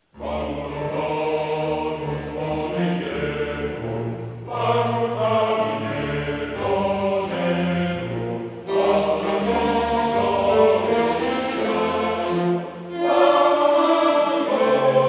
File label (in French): ensemble vocal et instrumental; dauphinelle; Stabat Mater Rheinberger Virgo Virginum